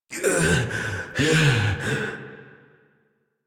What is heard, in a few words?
Death Adult; New War; Adult Operator